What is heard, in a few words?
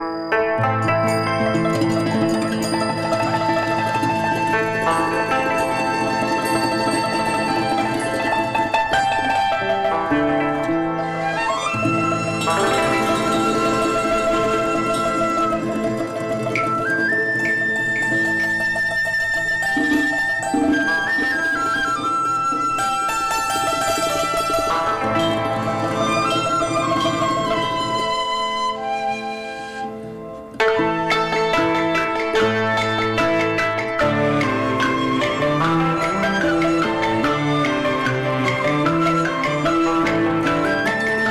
TRADITIONAL Chinese, Picking Flowers